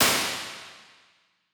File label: Hybrid Plates Classic Plate, Impulse Responses; Ableton Live 12 Lite